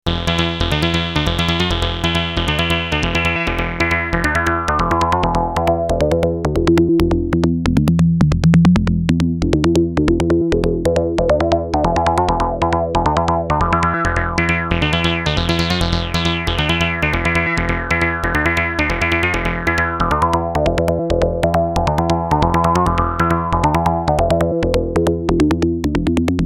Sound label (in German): Perkussive Sequenz